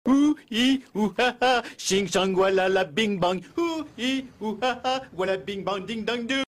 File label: hou hi hou haha; homer